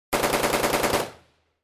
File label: AK 47 firing multiple bursts